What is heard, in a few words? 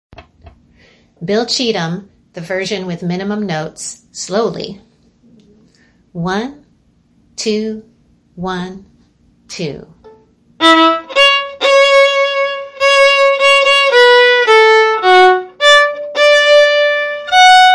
bill cheatem minimum notes slowly, fiddle